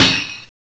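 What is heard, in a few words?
HI-HAT TAMBOURINE; CMI Sound Library Rev 1.3; CYMBALS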